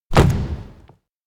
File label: blast away; anemoi